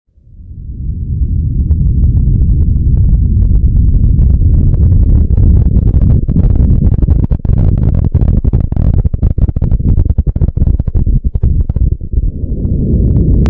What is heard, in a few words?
Earthquake Sounds - recording of 9.0 Earthquake; Japan